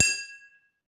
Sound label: noteblock glass; mesecons